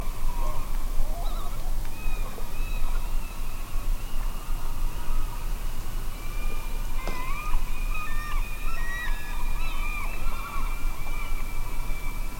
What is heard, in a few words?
tremelo, birds